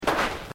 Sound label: Footsteps Boots Sand Step